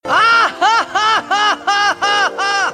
laugh; tidus

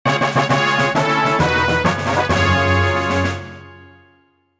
levelup; ffxi

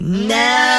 noooargh

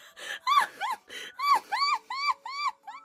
scrape glass

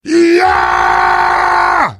heavy battlecry

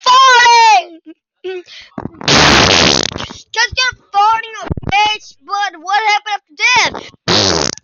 farrrttiingg

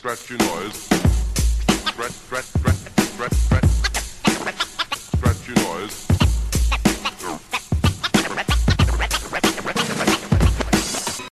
scratchy noise

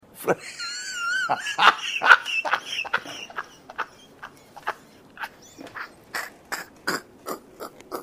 laugh

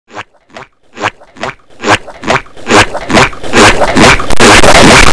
walking sound earrape; squidward

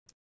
clickkkkkk

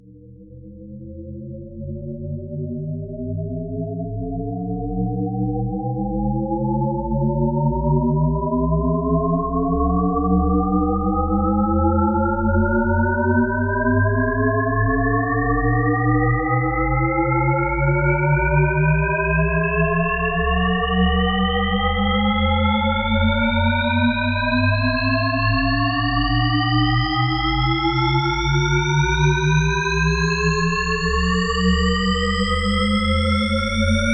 shepard tone

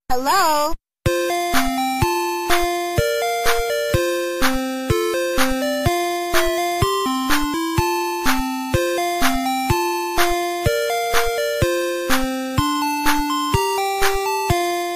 ice cream truck theme

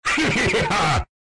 hihihiha

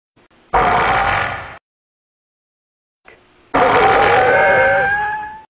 roar; miclas

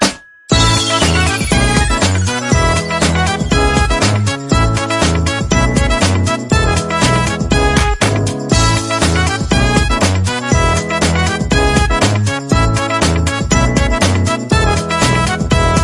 trumpet

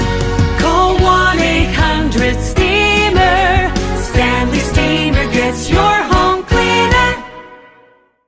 jingle; stanley steemer

